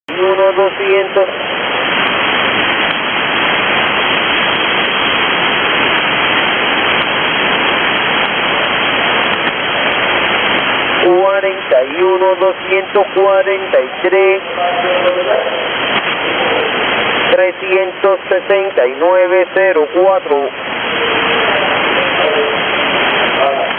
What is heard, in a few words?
6529 KHZ USB Cuban Babbler V21